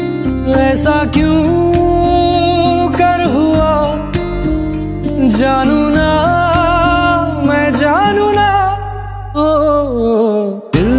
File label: Romantic Hindi Ringtone